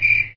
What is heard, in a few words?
critter, wildlife; minecraft; technic